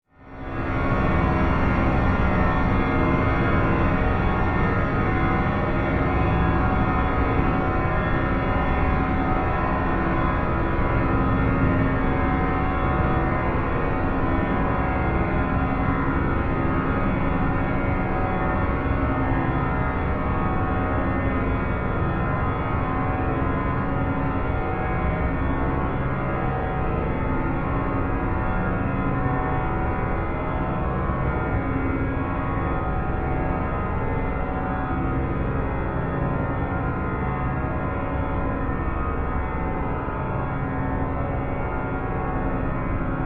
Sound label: drone fade long, Various sweeps, pulses and drones; Drone Sound Effects; Sound Design